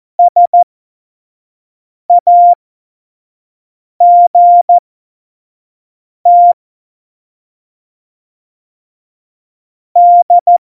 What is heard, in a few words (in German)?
Witz, Morsekurs, Tempo 25; Witze